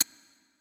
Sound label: Button Dialogue Highlight; helltaker